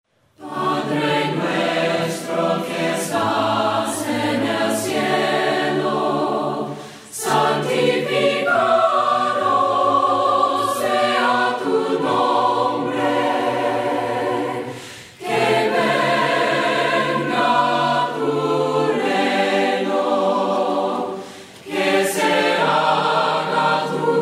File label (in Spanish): PADRE NUESTRO VOCES, himnos a capella; cantos del camino